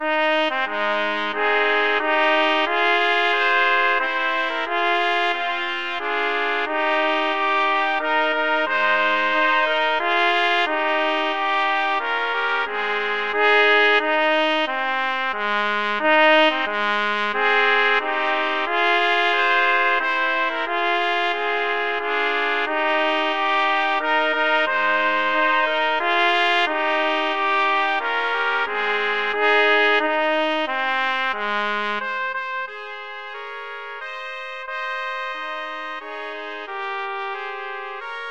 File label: trumpet trio